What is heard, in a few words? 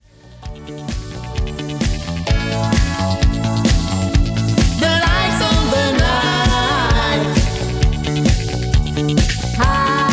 drums